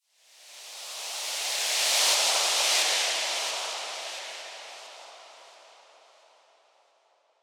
Swept Noise

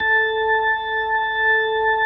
A4, B3

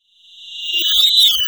REV, SOUND EFFECT REVERSE

